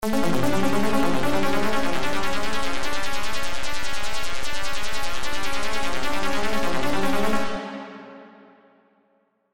Gravitational Sweeping; Stepattern

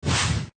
swoop medium

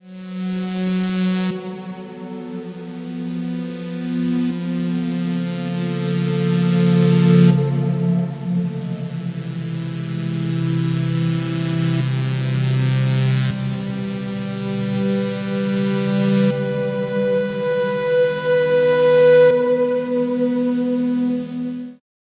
Stellar Gritty Dust; Astra Turbo Quasar

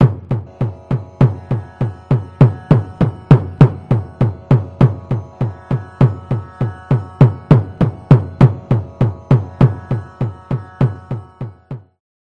Indian Khanjira Ganjira; Drumwavy

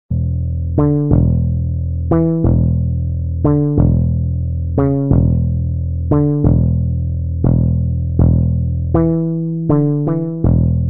Chorus Bass Chorus Bass Dry; Vox Quantizer; syntheway

